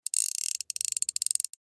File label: fly fishing reel pull short slow; UltimateFishing2020